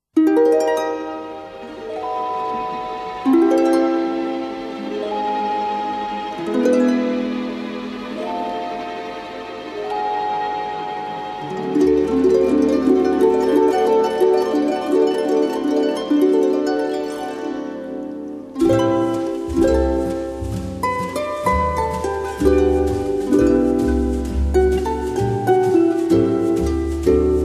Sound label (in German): harfenserenade; gesang song und piano